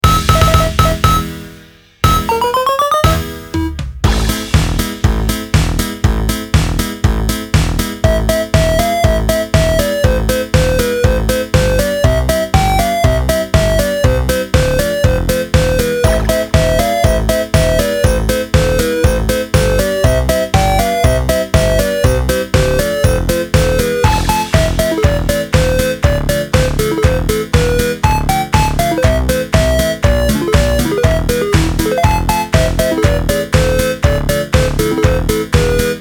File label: 8 bit games